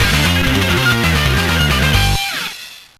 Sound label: Level Up; Dynasty Warriors Advance; gba